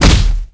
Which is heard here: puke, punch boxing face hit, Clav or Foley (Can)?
punch boxing face hit